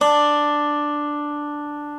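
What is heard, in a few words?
reference pitch dobro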